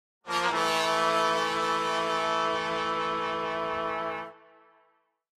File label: Horn Section, brass trumpet